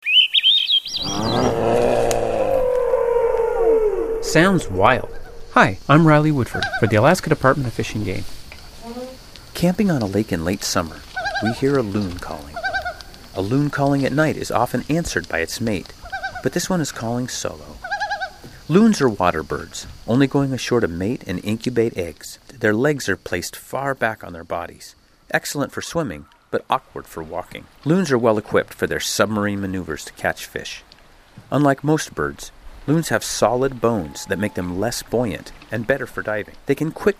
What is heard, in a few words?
powerful loons